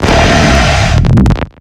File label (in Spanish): Grito de Darkrai; pokemon; sonidos pokemon